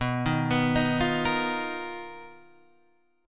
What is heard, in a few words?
B Major 7th Flat 5th B, chord shape; BM7b5 Chord {7 6 9 8 6 6} arpeggiated